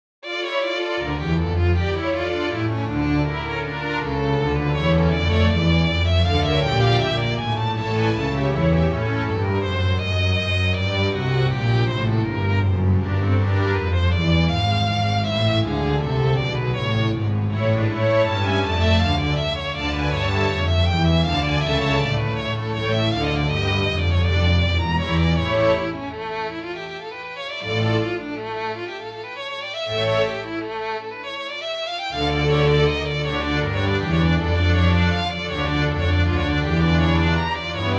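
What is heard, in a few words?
Bach concerto for 2 violins D minor; 3 ch koncerta dlya 2h skripok d-moll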